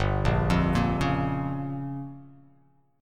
G Minor 7th Flat 5th

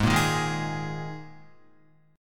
Ab Suspended 2nd Guitar; strummed; JGuitar

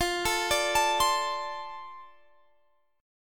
F 6th F

